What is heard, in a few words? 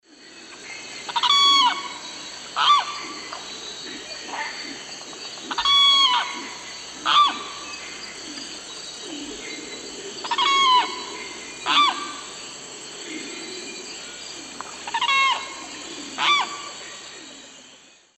Aramus guarauna, song; S. America; Eastern Lowlands